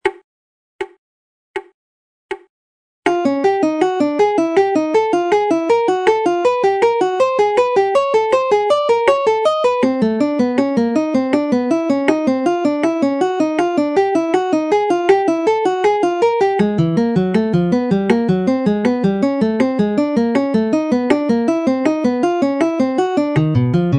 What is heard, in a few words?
alternate picking exercises, guitar